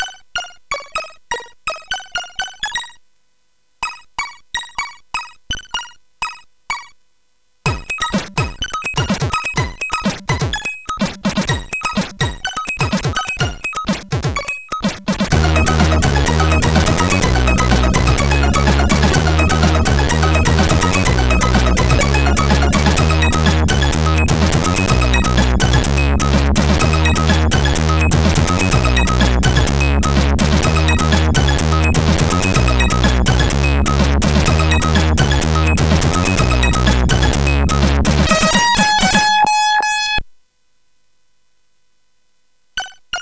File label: Remixed, CSG8580R5; HVSC